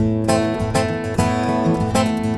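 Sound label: A minor, acoustic guitar 100 BPM; Best Service; World Colours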